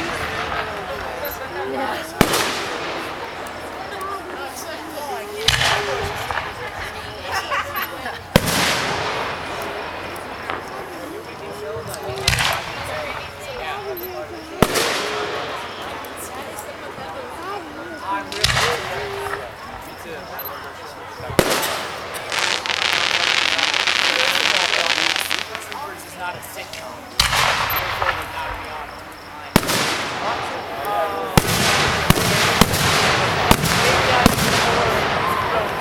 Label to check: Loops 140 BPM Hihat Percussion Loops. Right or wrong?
Wrong